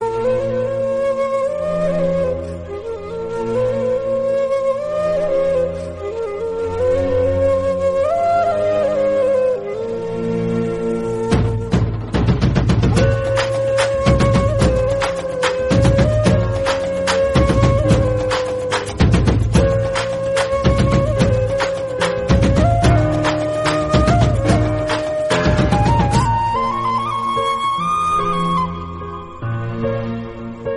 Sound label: tamil song bgm